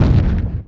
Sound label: collide